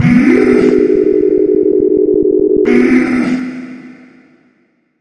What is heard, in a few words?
elevator; Gachitale